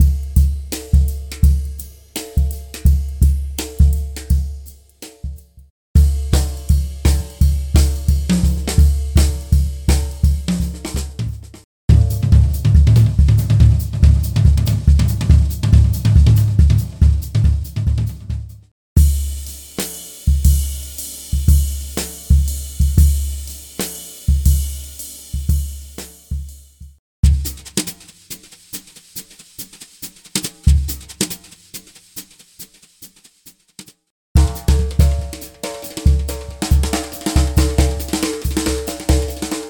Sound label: jazz drum